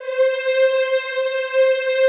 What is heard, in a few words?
EEH C4, Extended Classical Choir; Best Service